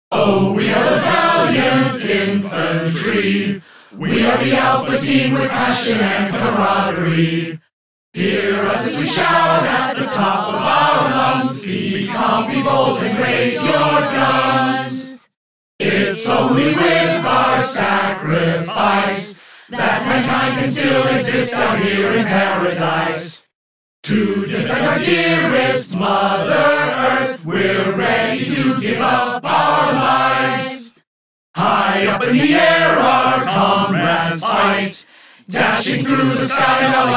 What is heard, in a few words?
Infantry Song (English version)(Vocal); Earth Defense Force 5